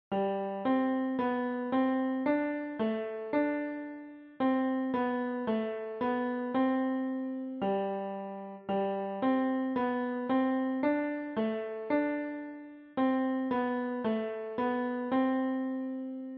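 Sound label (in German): Klavier; Aura Lee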